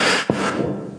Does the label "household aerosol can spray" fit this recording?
No